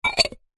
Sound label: household ceramic bowls square x2 stack